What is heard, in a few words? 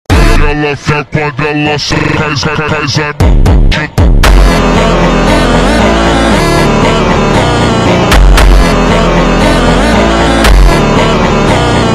slowed reverb